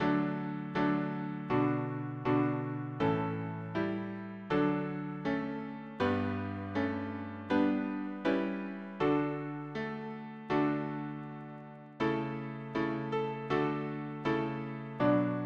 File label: harmony piano